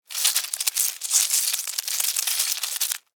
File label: Cockroach Fight, Animal, Cockroach, Fight, Battle, Attack, Scurry, Insect, Bug; Blast Wave